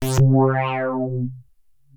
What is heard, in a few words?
Access Virus B; Synthworx